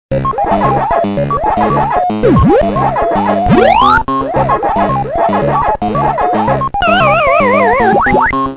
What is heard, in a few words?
Block 8Bit